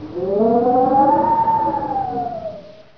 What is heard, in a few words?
wind big